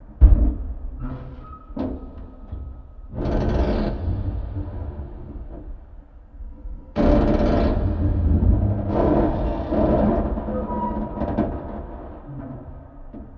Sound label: Ship Creak, ambience spaceship